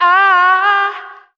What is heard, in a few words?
"ah" SUNG, Voice